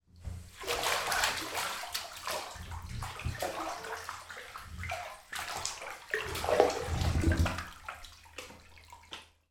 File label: household bathroom bath water person movement